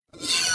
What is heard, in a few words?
squirrel explode